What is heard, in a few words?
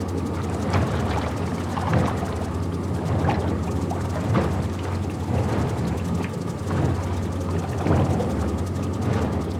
chemical plant; factorio 2.0.55